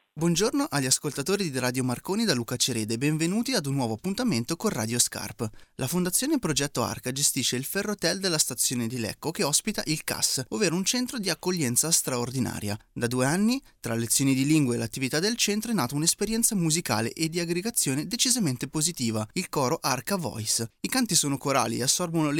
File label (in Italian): Coro Migranti; Radio scarp; ArcaVoice